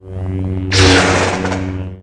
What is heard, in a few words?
big hit, lightsaber sfx